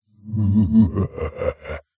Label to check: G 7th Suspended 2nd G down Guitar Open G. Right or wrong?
Wrong